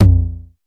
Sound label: Tr-909 Tom, Dance